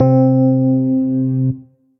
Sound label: Piano; TransAm Piano